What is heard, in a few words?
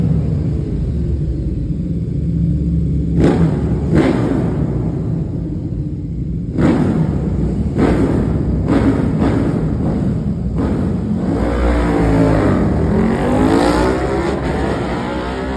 KTM, اگزوز